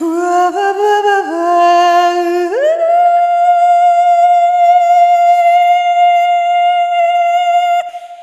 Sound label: Arrhythmic F